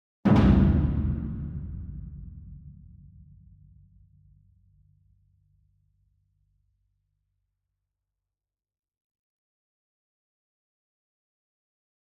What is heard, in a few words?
ENSEMBLE DRUM Bass Drum Concert And Taiko Flam Far; boom library; Cinematic Strikes Construction Kit